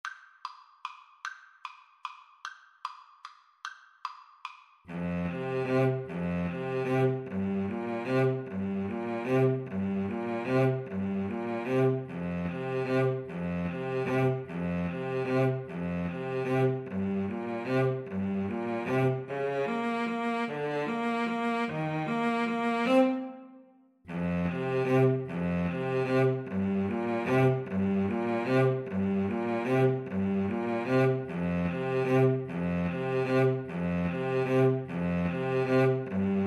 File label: flower waltz, Clarinet Cello Duet